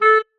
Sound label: marimba penguin; ゆめ2っき